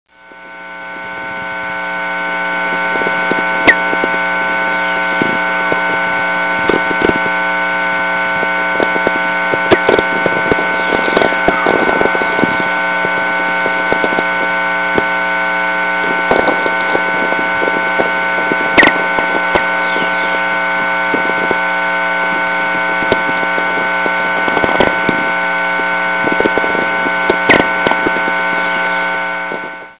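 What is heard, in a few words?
whistler; Natural VLF Radio Emissions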